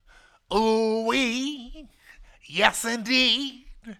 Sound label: Funky Mama Vocal, 70s Analog Funk